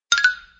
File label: chat